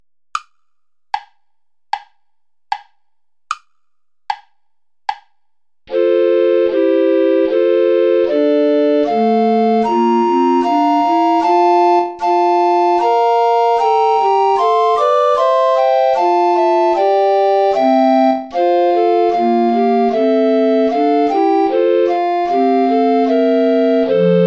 play along without soprano recorder

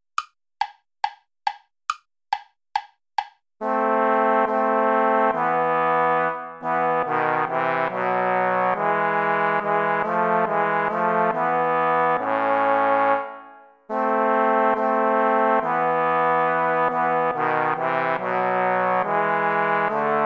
allemande play along without trumpet

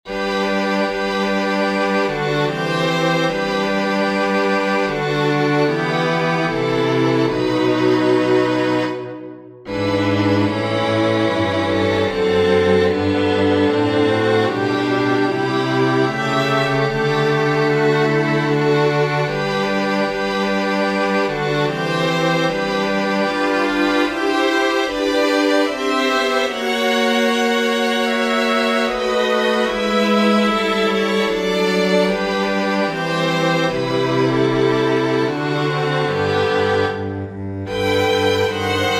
string quartet

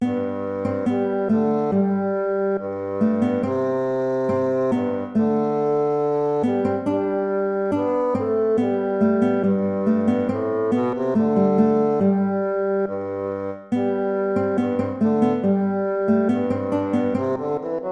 ballet; praetorius michael

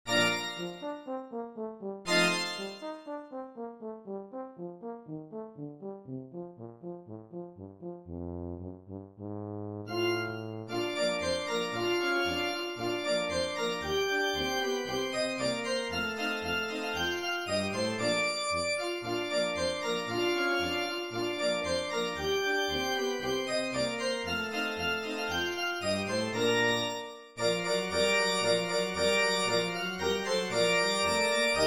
jingle bells organ transcription; pierpont james; musical joke

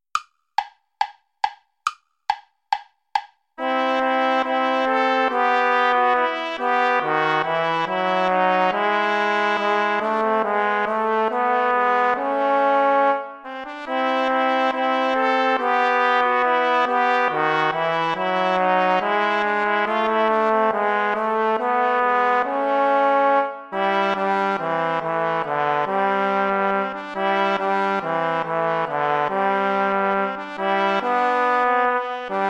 allemande play along without trombone

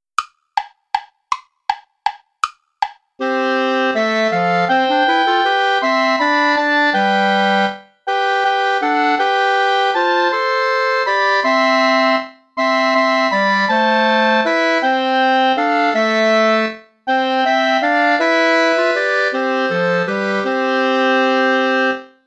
play along without clarinet; vulpius melchior; die helle sonn leucht jetzt herfa

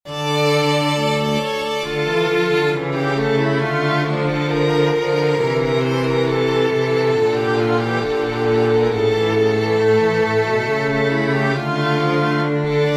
suitte for string trio

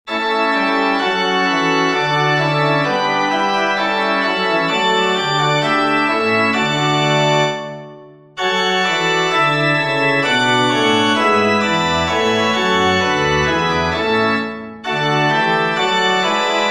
choral for organ; bach johann sebastian; dies hat alles uns getan

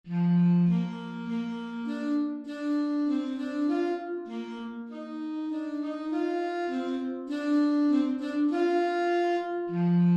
andante; lewis alastair

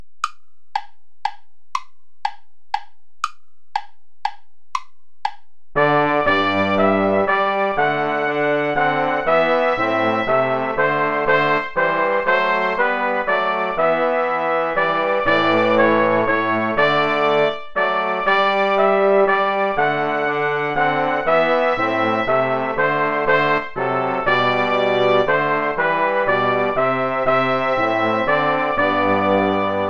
play along without trumpet; scholefield cla ment cotterill the day thou gavest lord ended

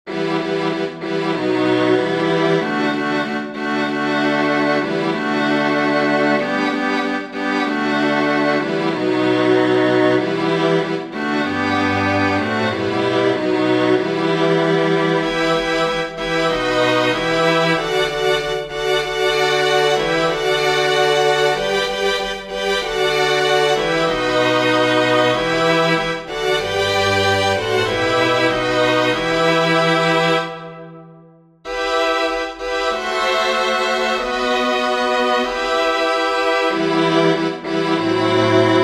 string quintet

